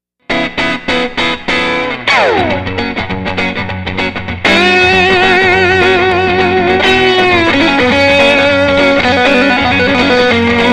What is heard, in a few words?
Strat Rhythm Strat Lead